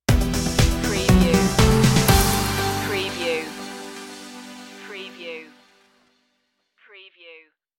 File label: elements stinger